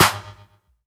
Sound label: SD HOU, Dance Mega Drums; Best Service